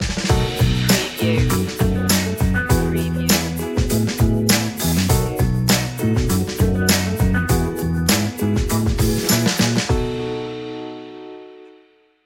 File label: smooth deal stinger